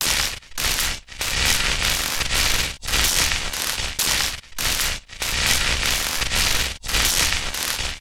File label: damaged panel; paradox